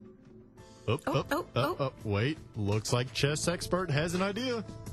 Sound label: crowd react for oh sound